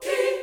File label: choir